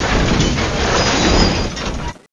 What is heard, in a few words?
Train Bridge Collapse 8Bit; abbottabad compound